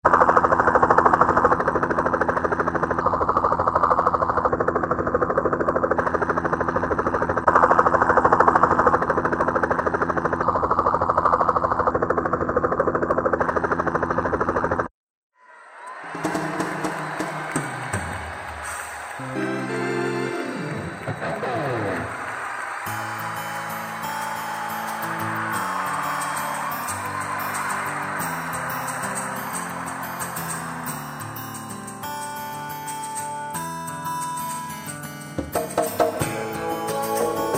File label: surround speaker